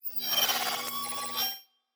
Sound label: Data Calculating